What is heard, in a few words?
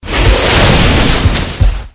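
Dragon buff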